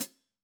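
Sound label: Hats Pedal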